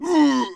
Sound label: dead, monster; thiefboss3